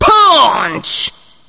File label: punch, weapons; balrog9